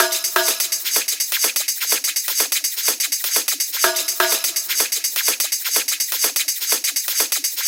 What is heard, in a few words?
Percussion 125Bpm, Techno; Percussion Loops; Head Hunter; Ghosthack Advent Calendar - Day 6 - Techno Kit